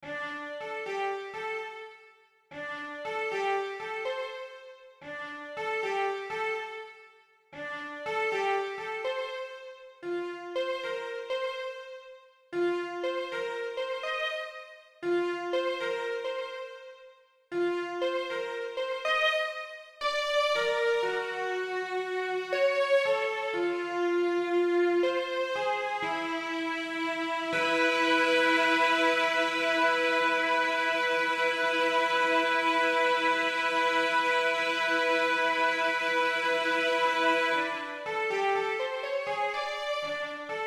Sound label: Dark Church